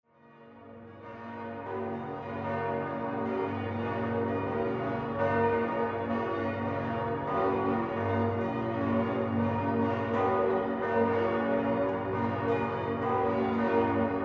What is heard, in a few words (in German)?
Domgeläut; Einläuten aller Domglocken; Dom zu Speyer; CD-Veröffentlichungen aus dem Dom zu Speyer